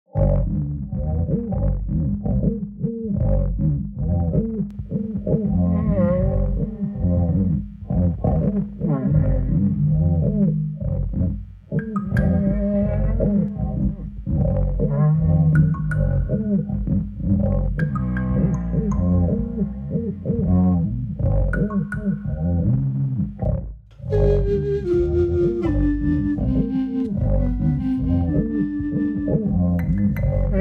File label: Rotisserie Friction